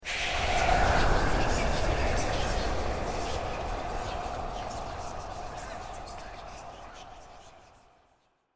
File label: War Dance